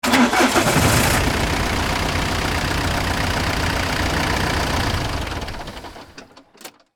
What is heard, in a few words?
Forklift engine ignition and shutdown; construction vehicles